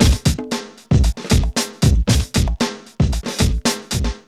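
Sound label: 115 bpm, Funky loops; Zero-G; Funky Elements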